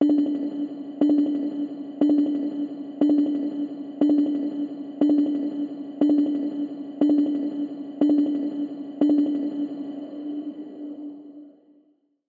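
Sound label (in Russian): игровой отсчет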